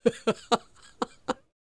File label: LAUGH, Jazz; Spectrasonics Vocal Planet; Jazz FX